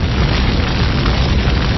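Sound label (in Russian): пожар шумный резкий звонкий короткий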